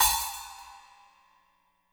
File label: HAT, KLANKY KIT; Spectrasonics; Burning Grooves CD2